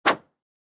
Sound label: Motion Swish